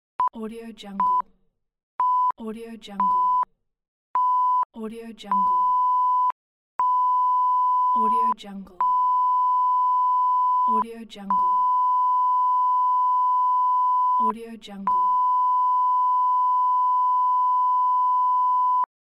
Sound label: censor beeps